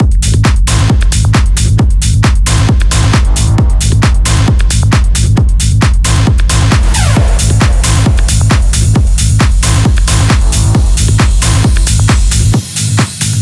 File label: techno; Mainground Techno Vol 10; Mainground Music